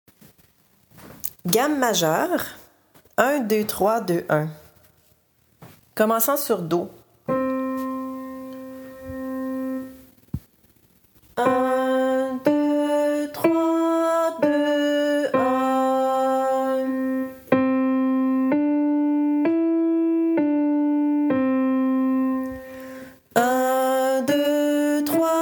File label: 1 2 3 2 1 Maj